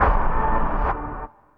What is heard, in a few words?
SHOT RVSE; BEAT2000